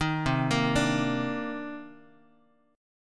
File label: C Minor 9th, Bass Standard; Cm9 arpeggiated; JGuitar